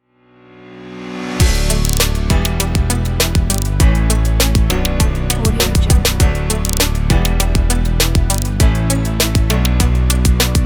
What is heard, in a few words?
technology inspiration